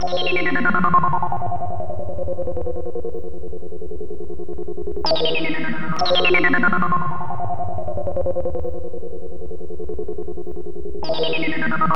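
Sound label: synth, Drum & Bass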